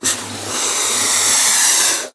attack

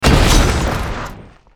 down; blackknight

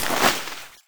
grenade launcher deploy; weapons

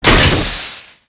gatling overheat, apc weapons; gow

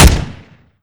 ar2 single

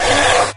pain, imp; doom3

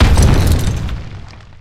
foot

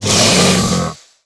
worm ouch, giant worm

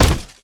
step walk

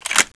insert, m1887; tfa cso; craft

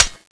clip in; tfa cso; ultimax100

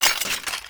pile lock

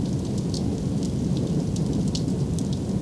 idle, weapons; dual sword; tfa cso

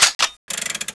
clip in; tfa cso; turbulent 1

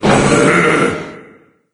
move start; doom3; sabaoth

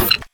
mk18 mag release; ins2; codol freedom

